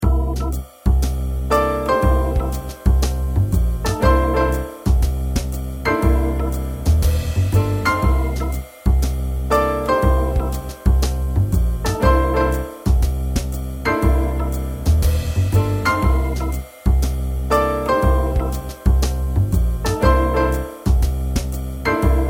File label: jazz